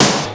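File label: processed snare, drums; Crypton - DrumBase5000; PROC.DRUMS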